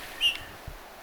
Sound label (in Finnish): lahelta aanitetty nuoren punatulkun aani